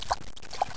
swim

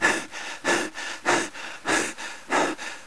breath extreme

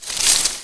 bush

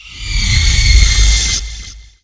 die, ghost

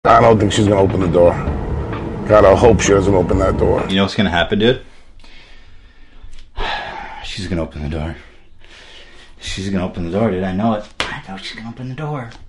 open

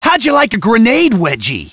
hurt soldier

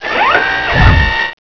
swing; gunner

